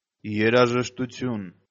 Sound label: Music